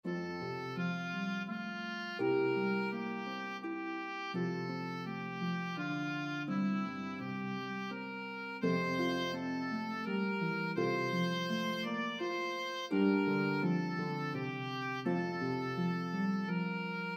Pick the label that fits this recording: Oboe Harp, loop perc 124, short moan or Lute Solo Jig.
Oboe Harp